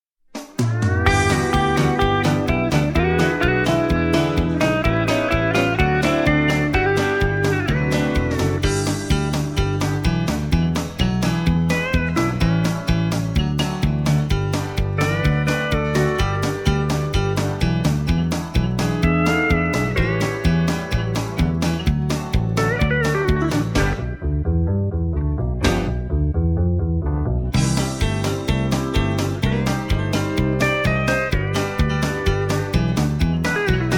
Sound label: ragtime call